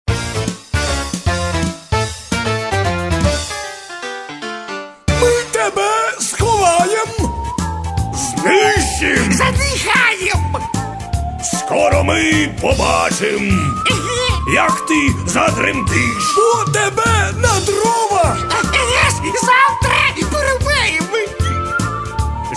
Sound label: TRIO; z Novorichnoyi vystavy; Kotygoroshko